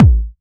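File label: BD Trancy, bass drum; Takebeat; Club; VEC1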